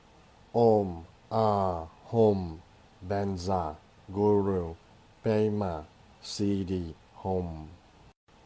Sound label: Vajra Guru Tibetan Mantra